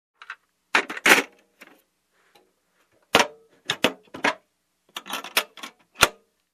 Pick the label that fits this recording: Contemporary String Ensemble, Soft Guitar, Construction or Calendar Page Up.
Construction